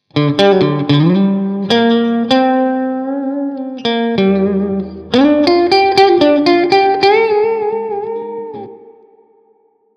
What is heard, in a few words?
solo soft; IK Multimedia AmpliTube Orange; dual terror; AmpliTube-Software